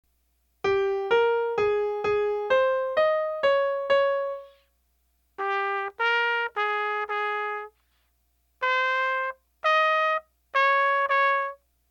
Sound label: ear training melodies modulated